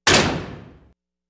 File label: Industrial Oven Door; appliances